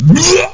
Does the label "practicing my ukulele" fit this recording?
No